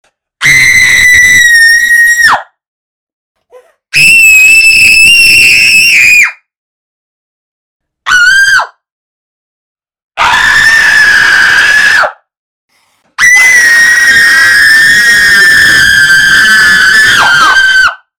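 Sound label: krik jenshiny ispug, woman scream fright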